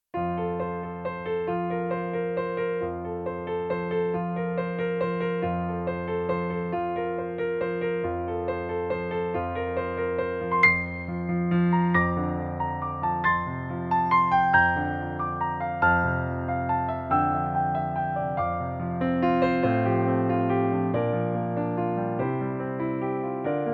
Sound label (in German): Pianoplayback sängerin F-dur